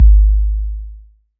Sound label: Kick Analog Mono